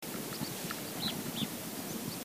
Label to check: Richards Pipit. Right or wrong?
Right